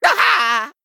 Male Gnome, Death

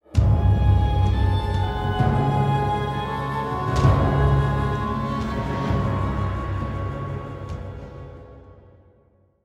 Play Stinger

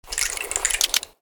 Crabmount Attack

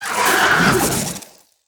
Arcanosaur Attack

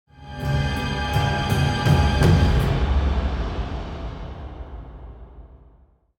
Play Stinger

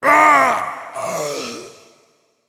Male Dread Lord Death

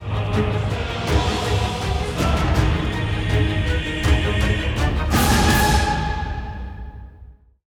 Legendary Stinger; Glugg The Gulper

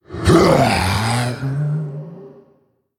Male Elemental, Death

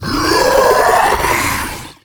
Aberration, Enter Play

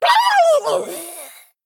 Female Murloc Death

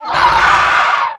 Ravenous Pterrordax Attack

